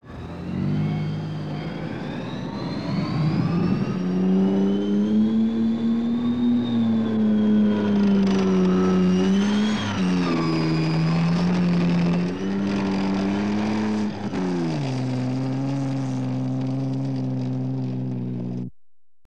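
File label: zvuki tanka, tank sounds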